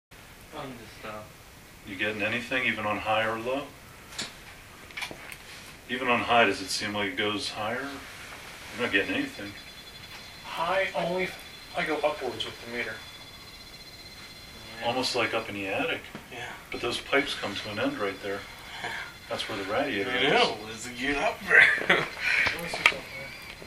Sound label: scream